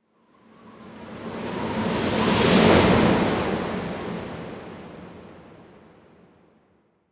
Whoosh; Whooshes

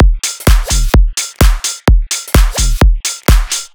Drum Loop - 128 BPM F; House; Full Drum Loops; Cymatics

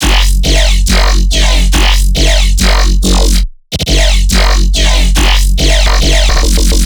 Riddim Bass Loop - F# 140; Ghosthack; Riddim Essentials Volume 2

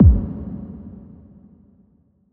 SFX Impact; Impacts; Ghosthack

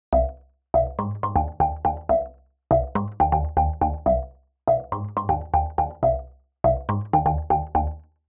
Synth C 122Bpm, House; Musical Loops; House Kit; Ghosthack; Advent Calendar

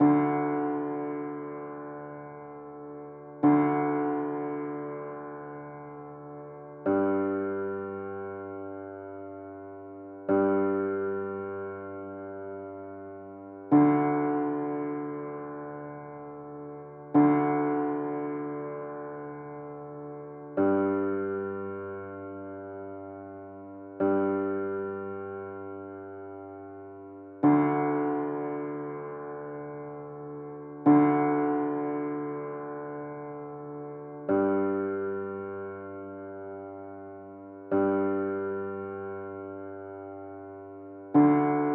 140 BPM D Min Piano, Drill; Loop Stems; Cymatics; 2022 Melody Collection - Drill; Woi

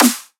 Snare

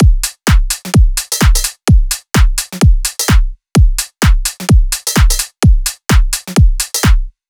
Shuffle Full Drum Loop - 128 BPM; Drums; Loops; Cymatics; EDM Starter Pack

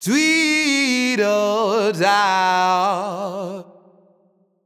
Euphoria Ad Lib Wet - 160 BPM C# Min; Cymatics; Vocal Sample Pack; Ad Libs

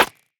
Rimshot, Snares; Drum One Shots; Cymatics; Eternity Sample Pack

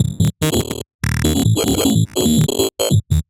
Grid Loop A 145 BPM, Psytrance; Grid Loops; Blast Off

